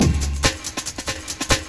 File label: Jungle, Drum Loops